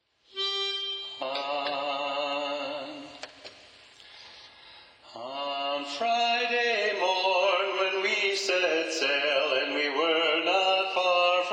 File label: Tenor Predominant; sea shanties; The Mermaid